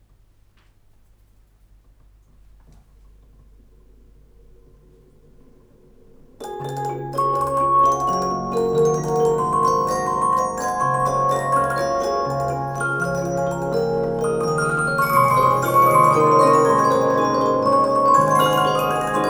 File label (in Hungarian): zenedoboz hengeres 6 dallamú svájc